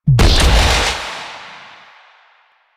Charged Shot, Sidearm; Weapons; Warframe; Tenno; Wraith Sidearm; Epitaph Prime

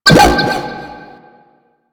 Bomb Ping; Missions; Sentient Defend; Amalgam

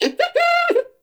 baby vocal singing

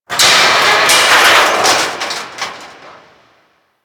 Bridge Break A; Warframe; Corpus Gas City

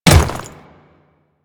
Ice Step, Footsteps; Royal Guard

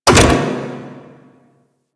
Gas Robot Repair Stop; Ambience; Props; Warframe; Corpus Gas City

